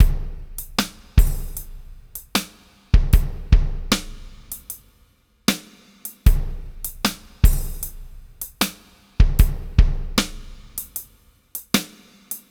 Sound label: 077 Pop Drums Wet; Pop Rock; Slow Tempo; Digidesign; Pro Tools; Pro Tools Essential Loops